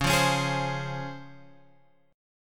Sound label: C sharp Major 7th C sharp Guitar Open G